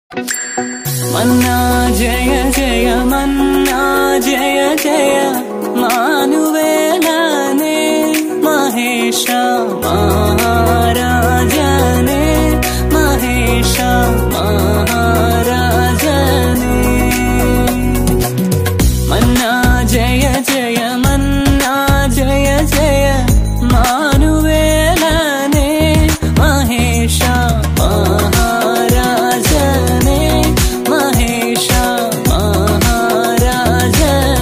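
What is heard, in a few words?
Christian background music ringtone Malayalam Christian song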